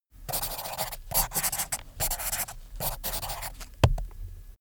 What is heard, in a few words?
pen on paper